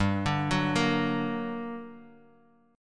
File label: G Minor Major 7th, down, Guitar, DADGAD